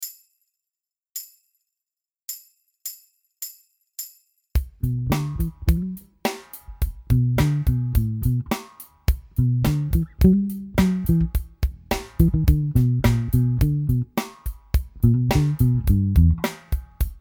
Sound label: R&B, bass